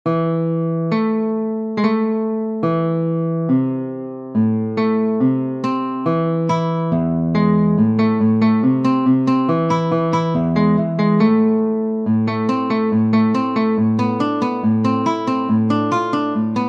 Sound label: Andante con moto, guitar